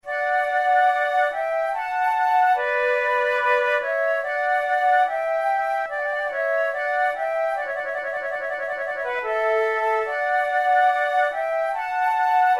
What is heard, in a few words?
sarabanda, flute